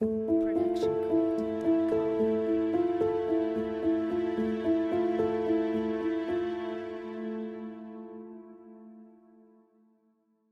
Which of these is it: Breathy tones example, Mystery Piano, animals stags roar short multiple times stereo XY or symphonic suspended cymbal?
Mystery Piano